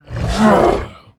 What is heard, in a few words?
Demon Hunter Attack